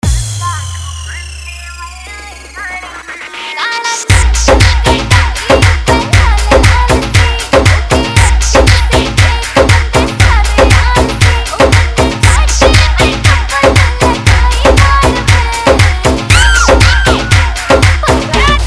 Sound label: Haryanvi Dj Remix